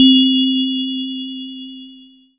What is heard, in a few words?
SYN K-2000, Roland